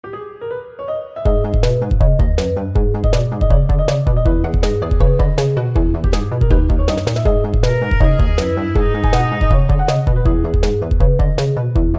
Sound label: music; idol carry